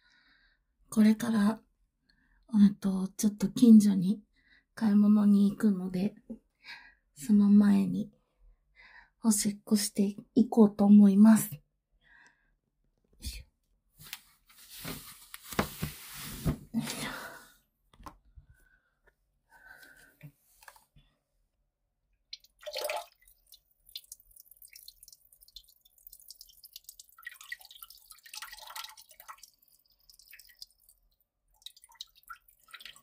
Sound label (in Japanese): オシッコ！はぁ…スッキリしたぁ…; 近所にお買い物に行く前に; いってきまーすッ！